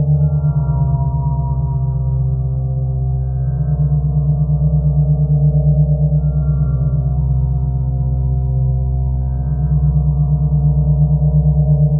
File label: SPHERE D+B 160; eJay